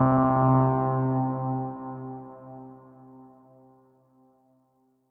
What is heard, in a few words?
Synth Prophet One Shots, Keys C; Electronisounds